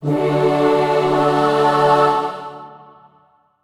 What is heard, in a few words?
Finger Reveal Stinger